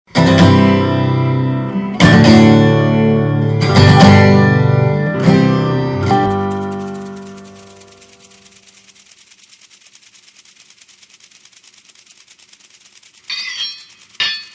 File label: Jingle, Intro